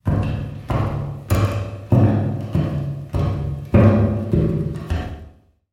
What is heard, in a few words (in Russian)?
shagi